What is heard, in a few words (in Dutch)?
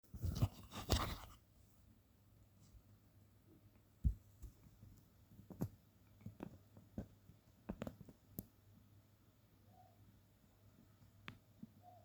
hond